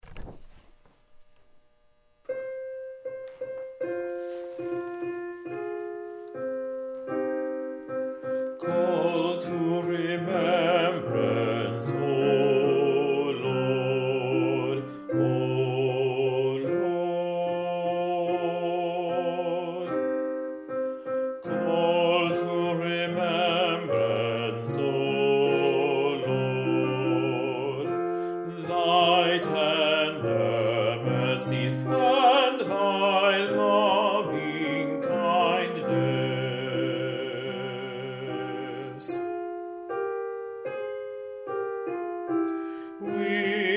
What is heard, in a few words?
Bass; Call to Remembrance, D. Farrant; Lent